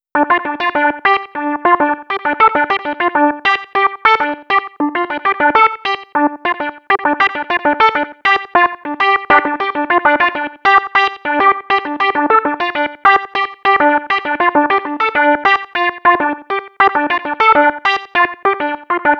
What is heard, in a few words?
Funky Strut; Sonic Foundry (Sony Creative Software); Electro Lounge; 100 Rate Plan